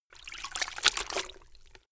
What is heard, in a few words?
cloth handwash; haus-garten; haushalt